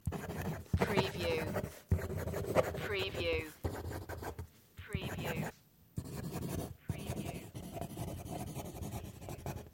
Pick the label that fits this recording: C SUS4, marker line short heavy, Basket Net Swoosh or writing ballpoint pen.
writing ballpoint pen